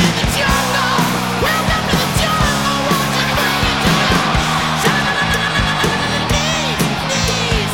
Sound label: big win loop